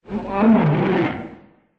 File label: roar